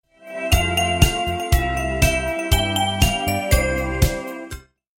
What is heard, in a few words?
jingle bells sms